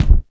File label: base drum; DRUMS